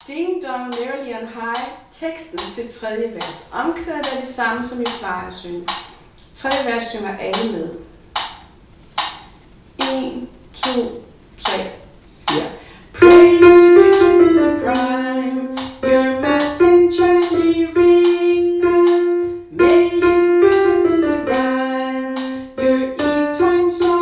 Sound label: Ding Dong Merely On High